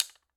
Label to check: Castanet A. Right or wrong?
Right